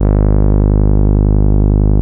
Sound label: TAURUS A2, synthesizer